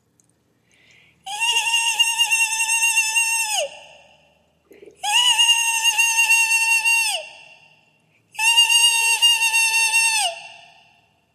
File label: beast